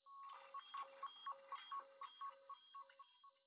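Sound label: computer room fade out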